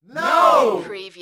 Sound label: Crowd No